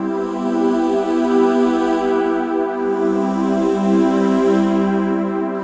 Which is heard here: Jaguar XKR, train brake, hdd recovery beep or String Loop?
String Loop